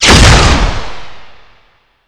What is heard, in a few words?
beamgun